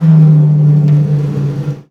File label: Snare Drum; Percussion Samples